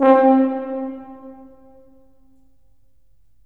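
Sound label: brass tuba; LA Composer vol. 3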